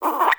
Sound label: Exterior Forest Critter